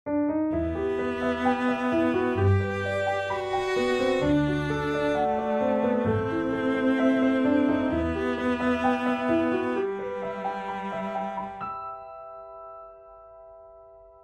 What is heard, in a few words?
Building a Rocket, Piano Cello Strings; 15sec